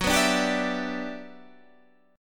G Major 13th

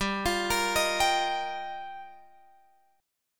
G Minor 7th Sharp 5th, Guitar, Drop D

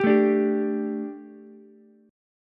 D Suspended 4th D, Ukulele; Dsus4 strummed; JGuitar

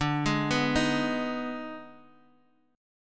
Eb Major 7th Suspended 4th Sharp 5th D

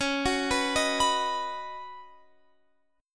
Db 9th Suspended 4th Db down Guitar Open F; Db9sus4; arpeggiated

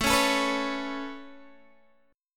A Major Add 9th A Guitar Open D, chord

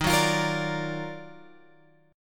D sharp Minor Major 9th D sharp, Guitar; D#mM9 Chord {11 9 X 10 11 10} strummed

